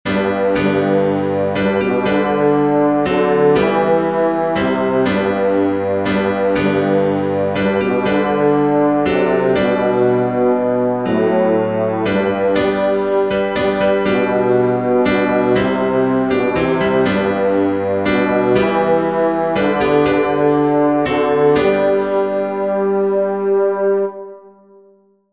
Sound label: new britain sound bass; “Amazing Grace”